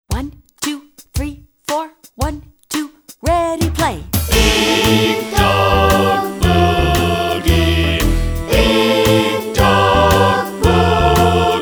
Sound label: Big Dog Boogie